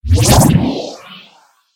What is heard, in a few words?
Warp